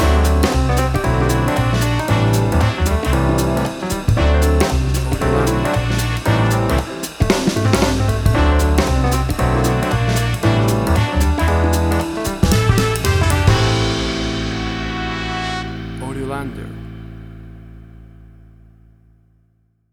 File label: Acid Swing Jazz Fusion Music